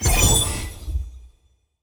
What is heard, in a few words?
gambler win; DraculasCastleWD; wazdan; ultrafast